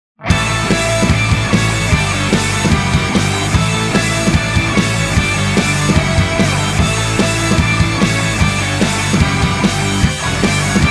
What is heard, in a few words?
BIG ROCK; Soundzabound Volume 5 Disc 2